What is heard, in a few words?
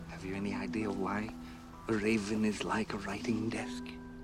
Is Calling RNB